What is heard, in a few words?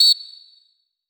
Whistle Sharp